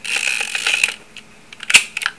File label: mamiya c330